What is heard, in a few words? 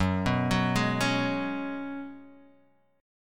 Gb Suspended 2nd Suspended 4th, Guitar, Half Step Down